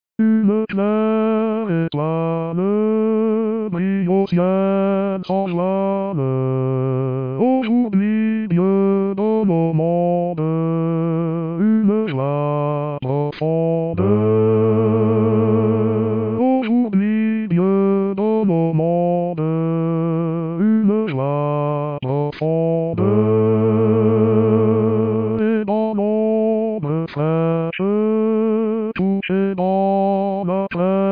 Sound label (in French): Noel Ukrainien Basse